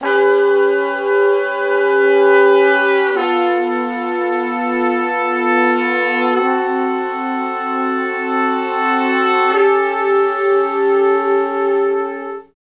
Slo Blues Chords [V, IV, I, V] (D); Sections; R&B; Crimson Blue and Fabulous Horncraft 4 RnB

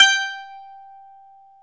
baglamas G 4

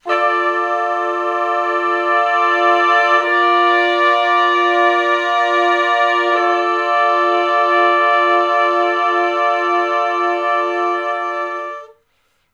Slo Minor Blus Chords (I,IV,I,I) (D); Sections; Crimson Blue and Fabulous Horncraft 4 RnB; Horncraft for R&B

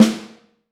Vintage Snare Drum; Q Up Arts; Johnny.C's Vintage Snare Drum; 6.5X14

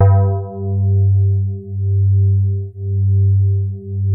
HARD G1, SYNTH PLUCK; Music Store - Claudius Diemeter Schwerlos

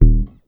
HHH Eb0, HEY HEY BASS; Sampleheads; Ultra Freakin' Bass

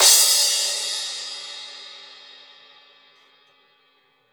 Drums Acoustic, RAW KIT; USB Soundscan; AKAI

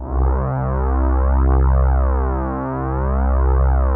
SYNVOICE C1; ANALOG PAD; Music Store - Claudius Diemeter Schwerlos

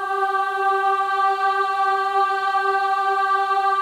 AH G4, WOMEN AHS

